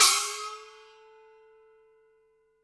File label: CYMB FX; Univers Sons - Raricussions